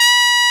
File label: FTR E5, trumpets; East West - Global Sounds